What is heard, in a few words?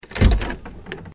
door open; Golden Crayon Sounds